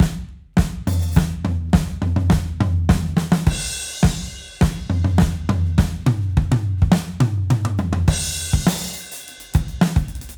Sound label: Room Smash Toms